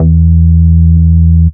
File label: Root E, Bass